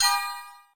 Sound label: pickup gold; GoldMinerJoe